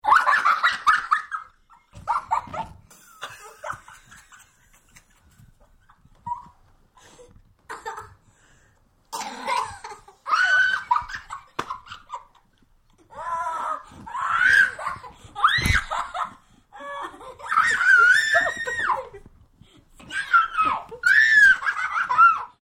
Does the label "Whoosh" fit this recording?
No